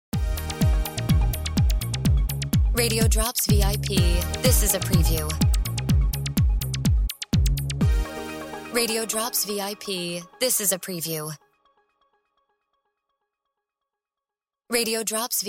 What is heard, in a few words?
Music Beds